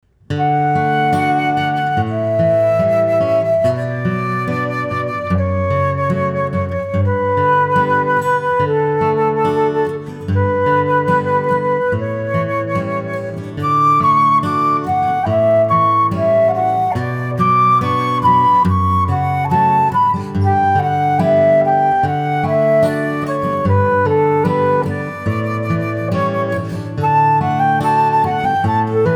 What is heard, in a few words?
canon